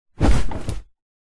vehicles car person sit in seat

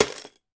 toy lego blocks put in full box; sound effects one

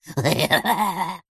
cartoon laugh male med high pitched crazy bonkers

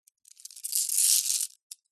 gemstones in small pouch tip out; zapsplat

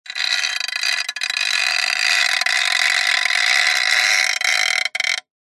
metal stress creak thin tone; zapsplat

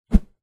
warfare weapon axe large object swing swoosh; zapsplat

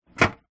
plastic file tray drawer open; office

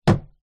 household window close wooden old single pane

